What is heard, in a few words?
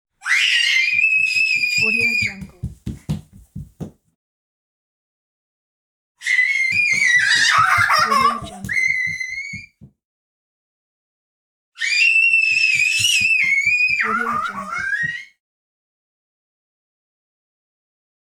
child screaming running into room; human sounds